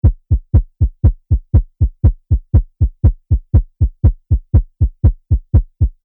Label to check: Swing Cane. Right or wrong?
Wrong